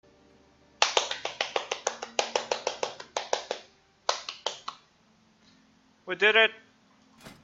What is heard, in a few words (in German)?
applaus